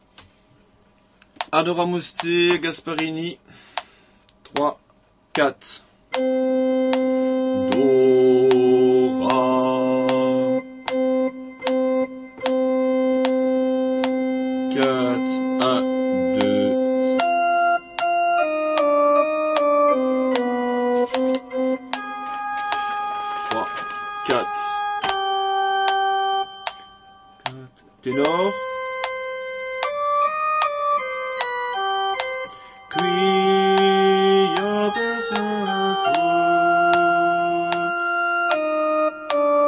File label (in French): Basse; Gasparini; Adoramus Te